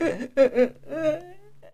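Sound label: horror crying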